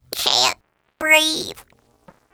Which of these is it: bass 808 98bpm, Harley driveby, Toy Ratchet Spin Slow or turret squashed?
turret squashed